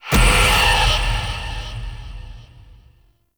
Evolving Weapons Upgrade Success; Warframe; Zariman

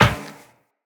Run Metal Perforated, Footsteps; Characters; Tenno

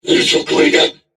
Surprised

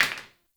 Plastic Broom Drop; Ambience; Gameplay; Warframe; Nineteen Ninety Nine

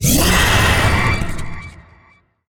Vocal Accent; Dialog; Warframe; Zariman; Void Angel

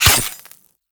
Melee Dagger Impact Robotic Flesh; Warframe; Daggers

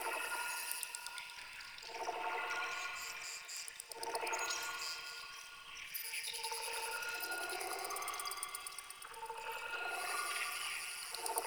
Critter Ambient Stereo Loop; Warframe; Tau; Ambience

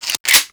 Reload Cock; Lex Prime; Warframe

